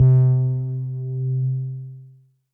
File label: Synth Prophet One Shots, Keys C; Electronisounds